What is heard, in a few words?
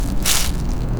picking strawberry from b; plant movement